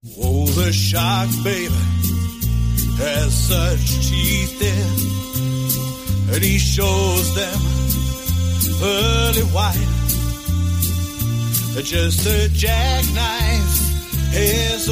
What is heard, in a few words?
SINGING, en-NZ